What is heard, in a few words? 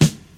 raw steel snare drum sample d sharp key